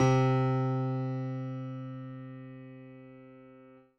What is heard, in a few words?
Grand Piano C3; Big Fish Audio; Old Skool House; OSH Piano